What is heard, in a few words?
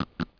snick; TileWorld